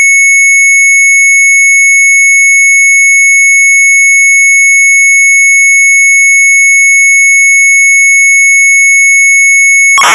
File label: Sharp MZ800 Tape; retromelsarchive